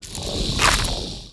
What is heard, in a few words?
VOLCANIC GRUMBLE WAR ATTACK